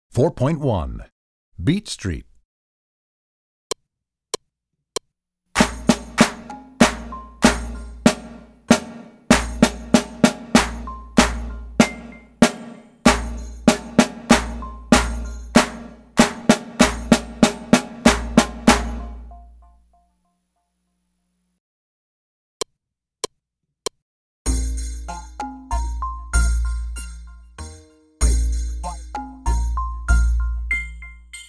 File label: Beat Street, Percussion; Percussion Book 2